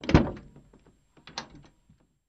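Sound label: Cutlass Door Open Fast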